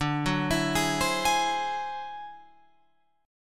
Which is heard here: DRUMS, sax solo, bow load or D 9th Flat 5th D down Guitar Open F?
D 9th Flat 5th D down Guitar Open F